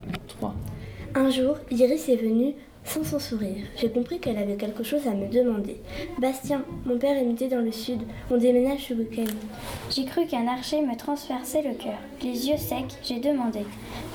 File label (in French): Un violon dans les jambes